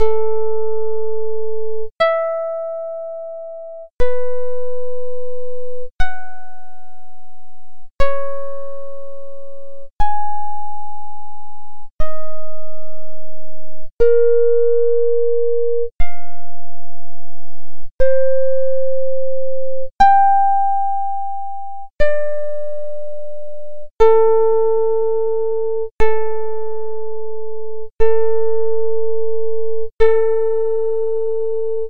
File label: tuning pythagorean comma